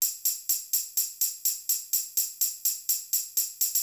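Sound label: percussion, house, 125, drum; eJay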